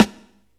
subtle reverb steel snare drum sound a key, Royality free snare tuned to the A no